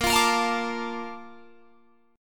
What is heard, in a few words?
Bb Major 7th, Guitar, Drop D; 20,20,20,22,22,22